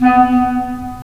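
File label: wind clarinet; Roland - LA Composer vol. 3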